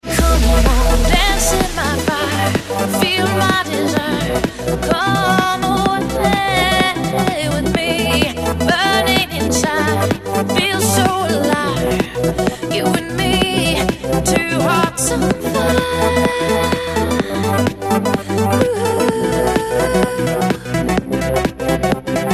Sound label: burning inside beat, electronic ringtones